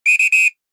Whistle

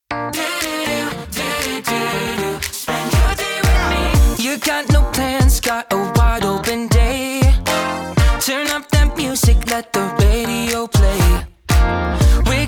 Come Over Vox

